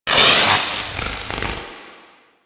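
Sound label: Infested Machine Spark; Warframe; Corpus Infested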